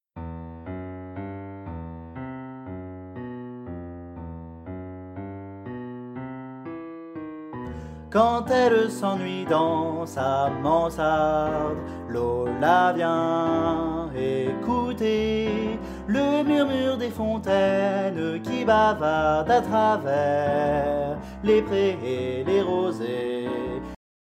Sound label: Soprano